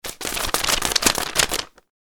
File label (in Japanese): 札の音; ボードゲームやカジノ、麻雀の効果音です。